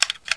load

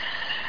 cricket; realmsof

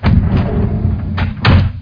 W DOOR; sw demo

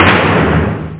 Tom Aggressive, Drums Percussion; hr16b

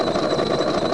treads; thexder

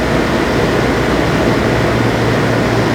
vent; Fx; willofsteel

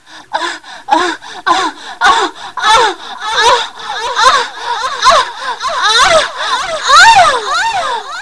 ORGASM; A Taste of Erotica - Edition 2.0

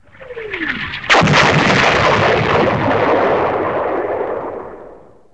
explosion; WEST DEMO

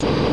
turb loop

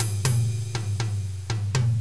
Music; Mythos

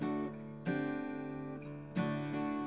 GUITAR

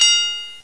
bell

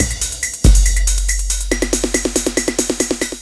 record music; Touch Games 2

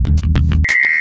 trigger; Sir Bombalot; 501 Great Games - Volume One; Guildhall Leisure Services

